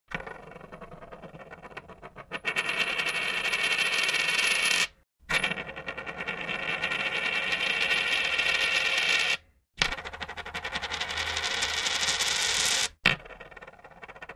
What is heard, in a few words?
Coin Spinning